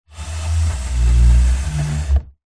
chair wooden floor protectors drag wood floor

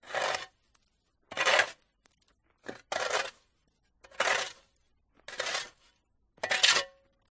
shovel clearing snow from path sequence; toolmisc; field reports

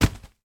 gerbil soft wood bedding pack drop on floor; animals

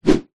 warfare battle axe swing

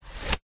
foley plastic slider slide; zapsplat

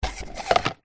office chair lever up slide hydraulic

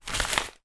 foley chef paper hat take off head

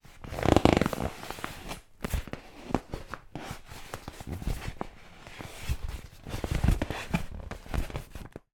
toy bop bag inflated handling creaks squeaks

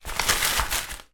foley chef paper hat put on head

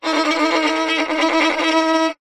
musical violin bowed scrape badly

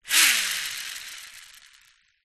leisure toy car pullback motor release air

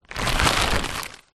foley tent pole bag empty movement

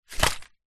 foley paper small thin pieces drop on wood; zapsplat

